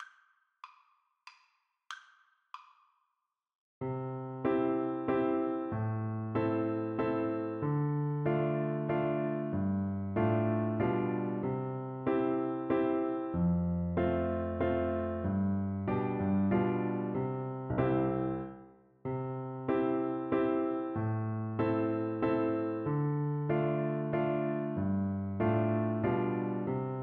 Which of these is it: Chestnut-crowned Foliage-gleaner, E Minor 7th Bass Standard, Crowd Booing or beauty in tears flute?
beauty in tears flute